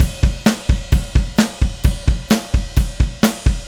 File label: Drums, Indie Rock; Sonic Foundry (Sony Creative Software); Indie Rock Anthems; Session 10 - Roswell